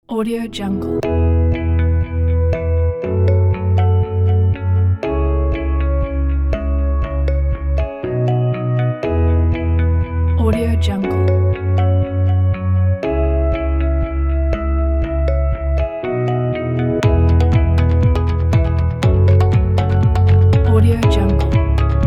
the corporate upbeat lite

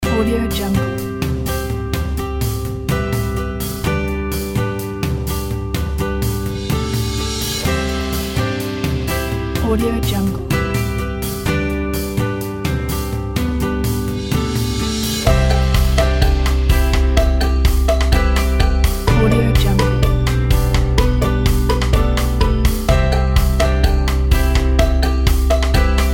light upbeat corporate

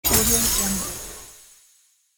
big win

